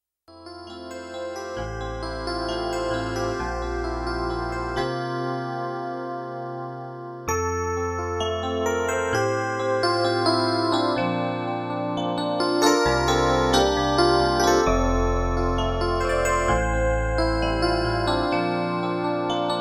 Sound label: Electric Piano; KOR K61P